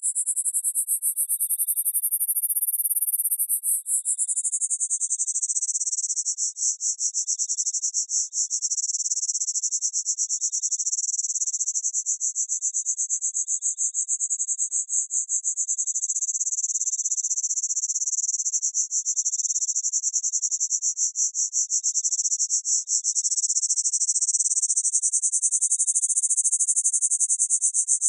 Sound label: cicadas dry